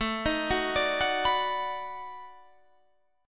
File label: B 7th Sharp 9th, guitar